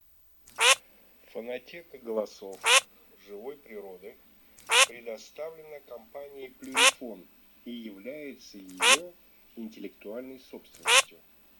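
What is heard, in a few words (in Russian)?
Чирок-свистунок Alzavola, манок; plurifon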